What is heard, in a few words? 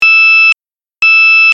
High alert; dexcom g4 platinum